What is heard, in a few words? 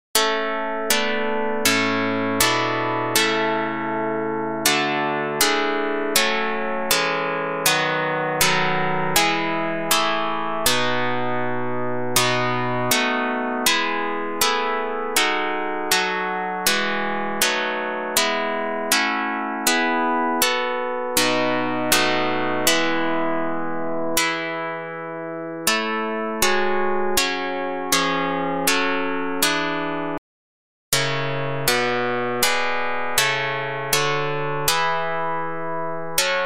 pluck